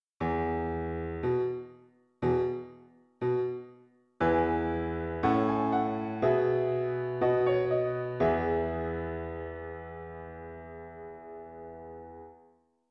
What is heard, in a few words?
Piano